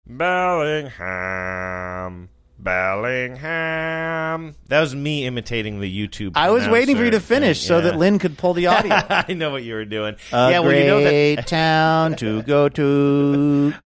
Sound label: singing bellingham bellingham hoping finished it sang great town